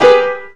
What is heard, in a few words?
piano; polskiemiasto